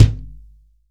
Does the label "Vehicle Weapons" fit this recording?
No